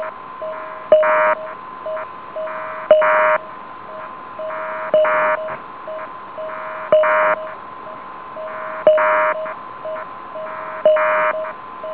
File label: LINK11